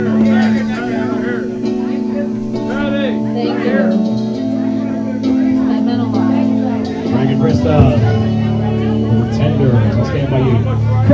karaoke

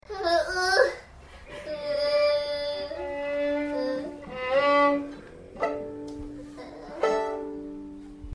violin

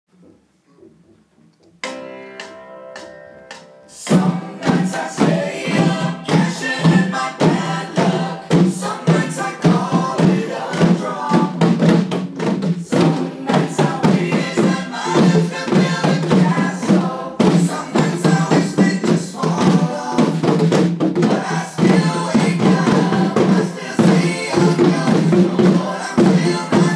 rhythm

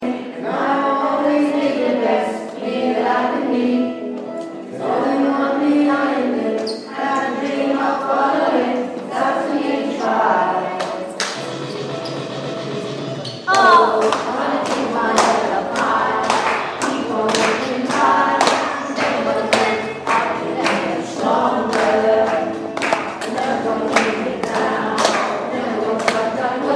school choir

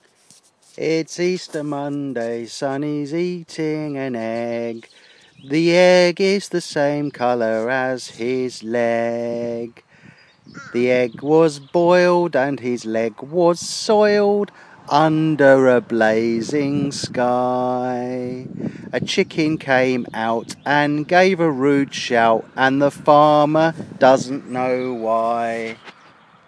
egg song

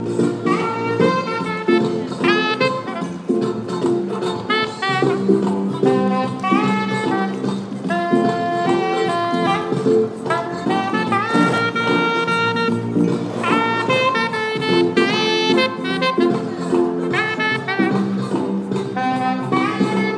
busker playing a soprano saxophone

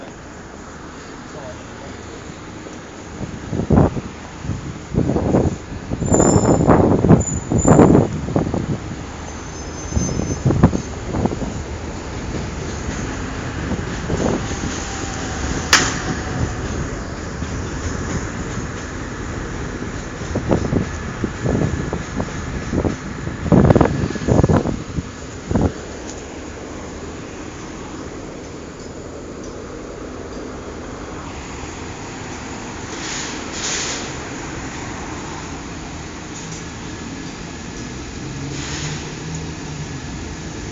cars train bus and a bridge busy london

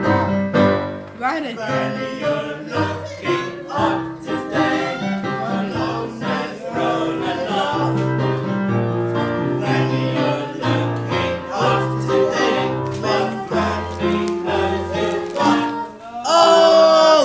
class 9 singing

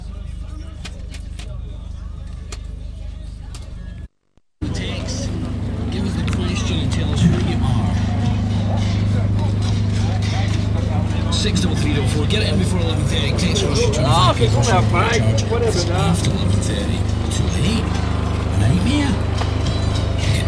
car boot soundscape

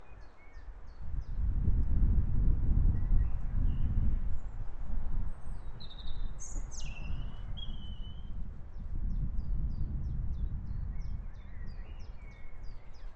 fantastic birdsong on sunny saturday afternoon

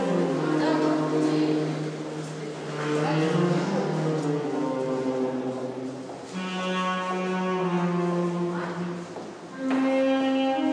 moscow subway sax

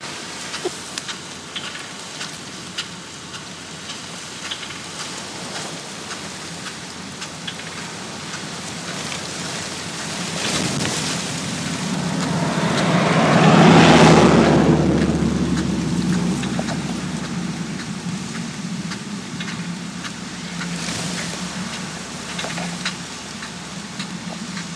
irrigation pump

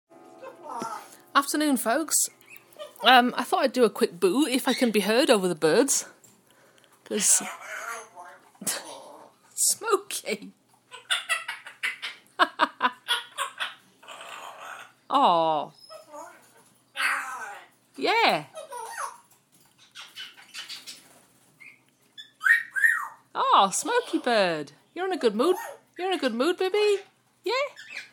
very chatty birds; kate discharged from the vets

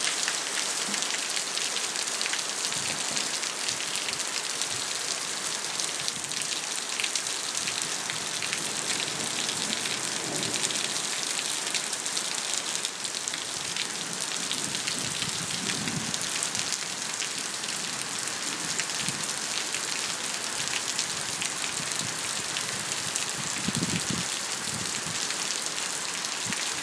thunderstorm in Cours se France

